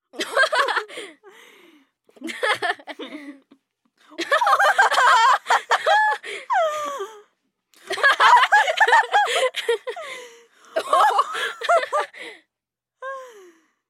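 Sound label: female laughter sounds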